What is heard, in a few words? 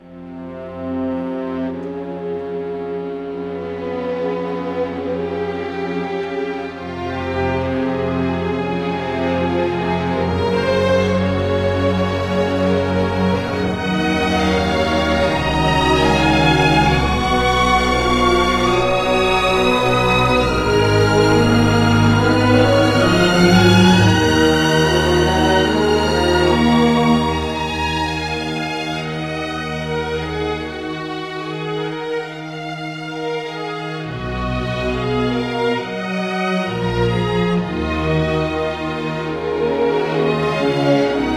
us defeat, music